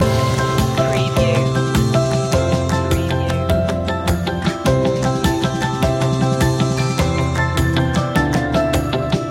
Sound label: shining through loop